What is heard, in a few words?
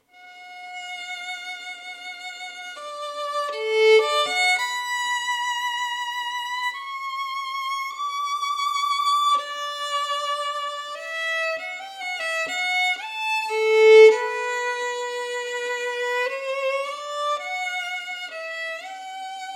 Stradivarius; elgar